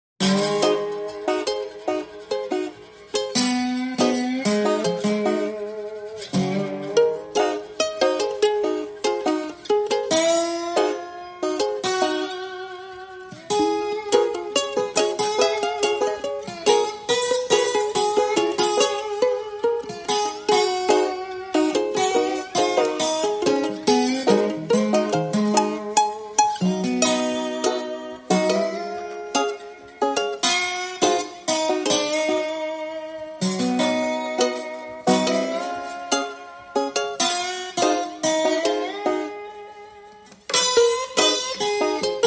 Guitars Only

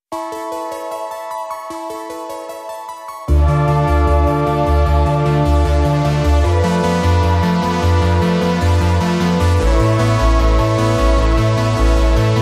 Small Breaths, No Drums; 30sec

